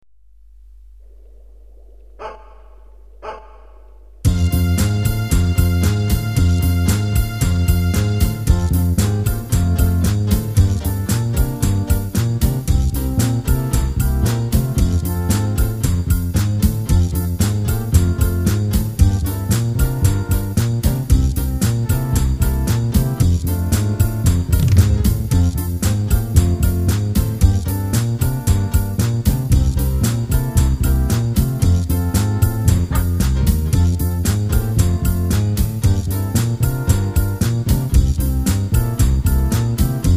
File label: komp 114 bpm